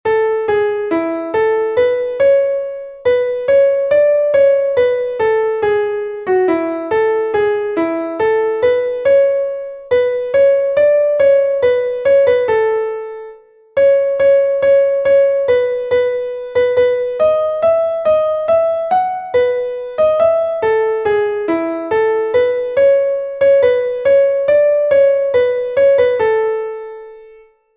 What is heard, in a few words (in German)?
klavier; MelodieMeister